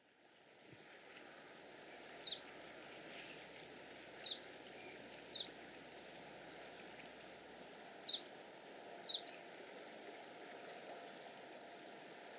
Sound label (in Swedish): Bergstajgasångare